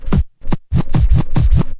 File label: turntable scratch; Scratching and Turntablism; DRUM LOOPS and BREAKS